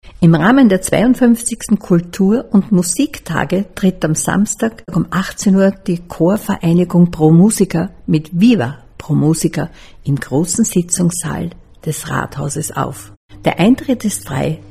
Konzert der Chorvereinigung; Chorvereinigung pro musica

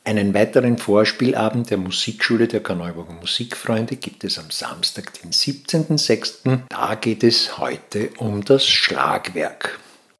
Schlagwerk

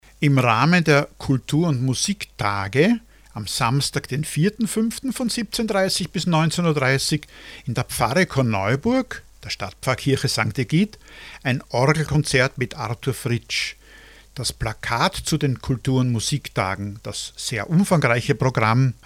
Orgelkonzert Stadtpfarrkirche; St. Aegyd

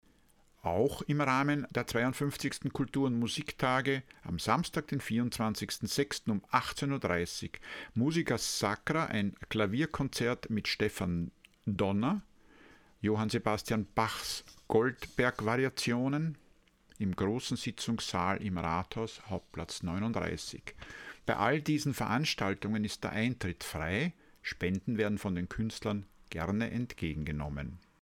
Klavierkonzert; Grosser Sitzungssaal Rathaus; Musica Sacra; Stefan Donner

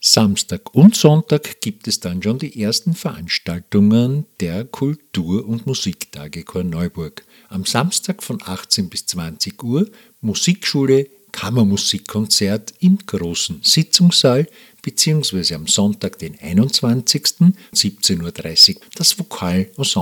Kammermusikkonzert und Vokalensemble; Heckenperlen

